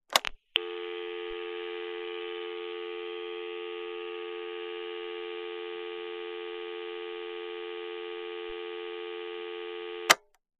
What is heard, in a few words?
phone lift dial tone